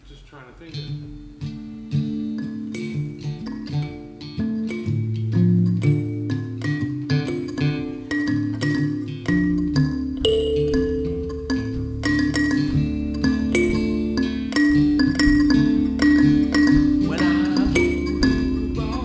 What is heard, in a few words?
Kalimba